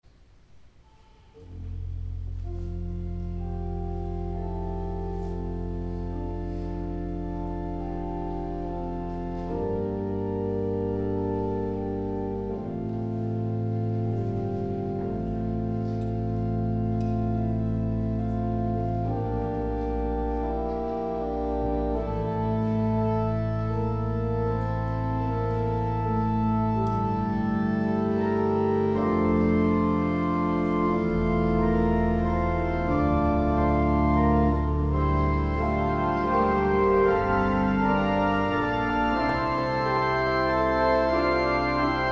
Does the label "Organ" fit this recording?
Yes